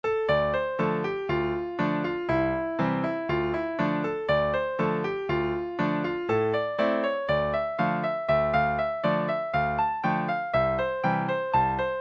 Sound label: whistle, c; julia delaney; scottish